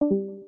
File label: discord leave